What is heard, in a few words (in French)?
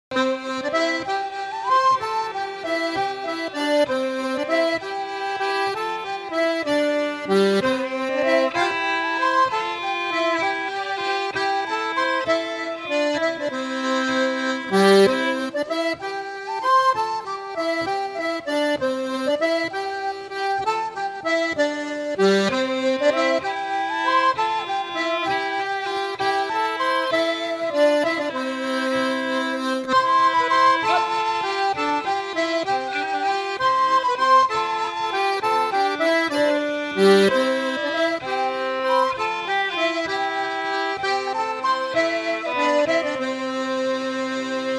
VALSES Valses Ecossaises